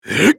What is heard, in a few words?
spell cast effort; Lucian